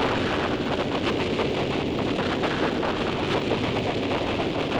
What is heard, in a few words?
Cracklature, sparse soundscape